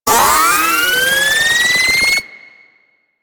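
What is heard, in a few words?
Sniper Charge Up Long; Warframe; Venus; Venus Bipeds; Venus Railgun Biped Agent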